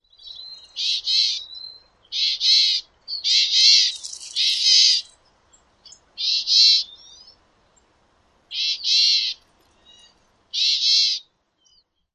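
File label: California Gnatcatcher calls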